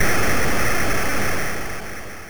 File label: launch